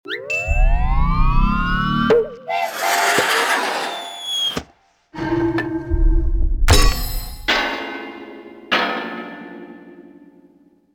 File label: Achievement; Womping; Scarlet Hood and the Wicked Wood